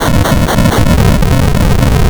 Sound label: Destroy; RPG